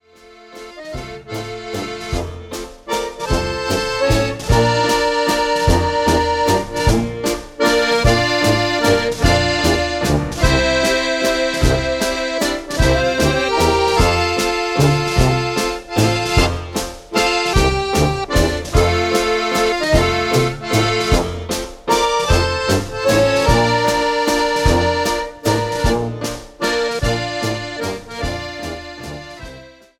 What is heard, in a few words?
waltz